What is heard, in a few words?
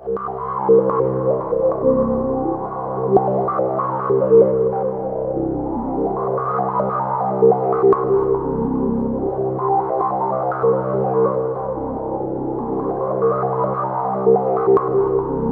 C1, Roland JV 2080 TECHNO PAD